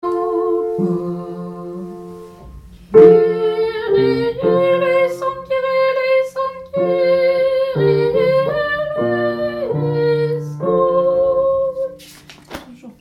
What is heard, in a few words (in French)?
Kyrie triple Tenor